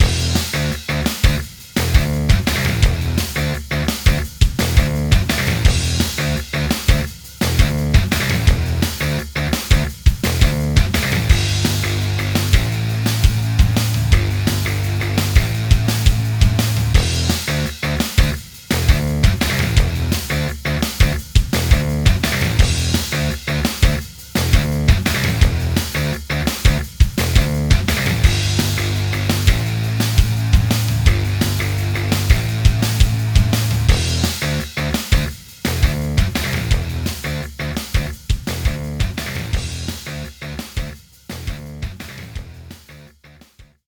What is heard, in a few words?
3d Music; Tecmo Bowl Throwback